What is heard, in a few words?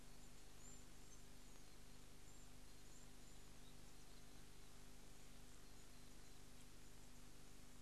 pallid cuckoo juv